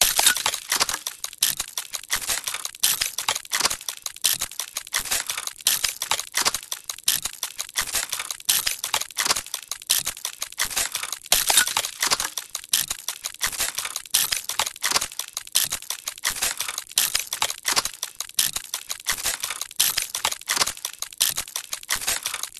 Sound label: Steampunk Mechanical Loop; PMSFX